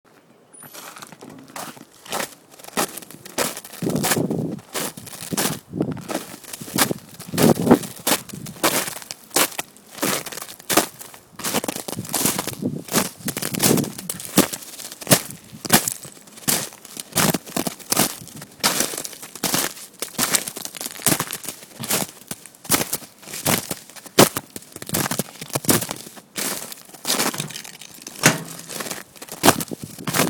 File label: snow crunch